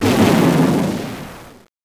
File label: lightning; Super Strategy & Simulation; Rune War